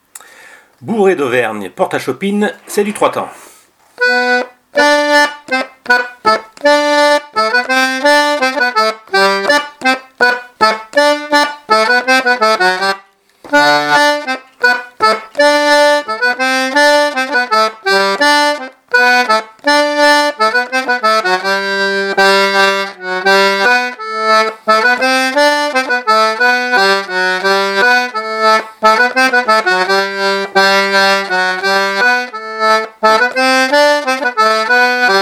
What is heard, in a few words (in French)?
Bourree; Porta Chopine